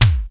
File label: 1980s smooth kick one shot d sharp key, kick single hit tuned to the D# note; kick drum samples